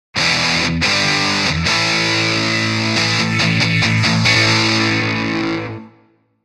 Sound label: Mean Guitar Sting